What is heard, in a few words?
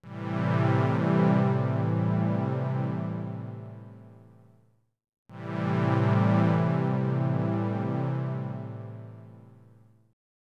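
pad 91 E minor; ProSamples vol.34 - Hip Hop & RnB 3; Just Butta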